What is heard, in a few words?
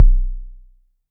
Kick; Ableton Live 12 Lite Core Library